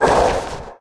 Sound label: lich damage, monster; rigel